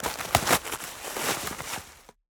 forest tree branch texture; sonniss gdc

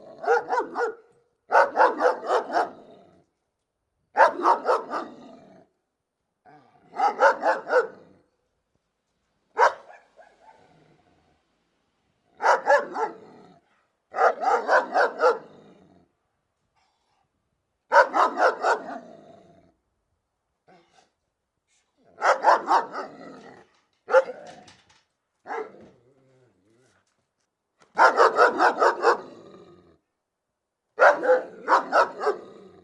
dog big close barking growling; sonniss gdc

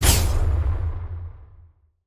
Emergency Shunt; lancer weapon fx

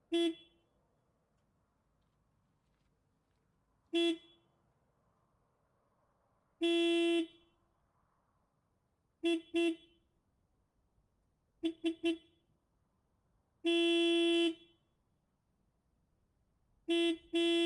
case puma 180 horn various; wide ab mkh8060; Sonniss GDC

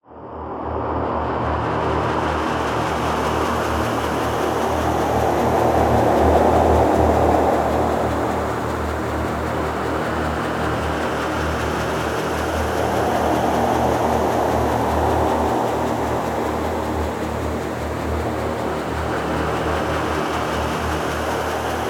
ambience; bluezone

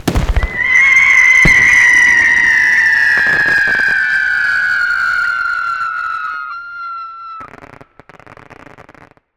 glitch screamers; Sonniss GDC

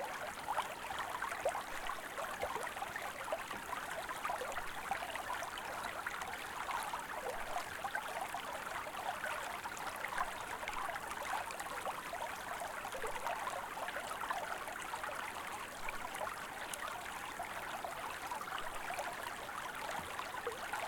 streams quietstream; fvtt sonniss gdc audio

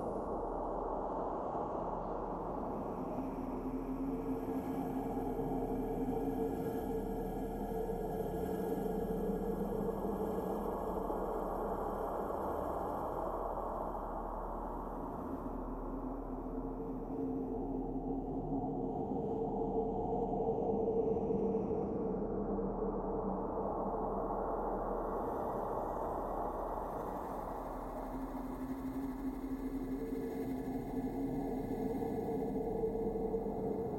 dragon barrow loop, ambiences; Michael Ghelfi; RPG Ambiences Vol. 6 (Short loops)

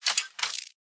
shotgun cycle; lancer weapon fx

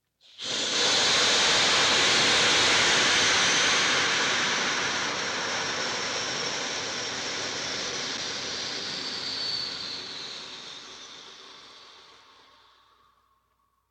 machine burst, machines and steam

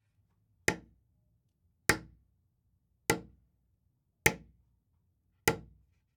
hammer

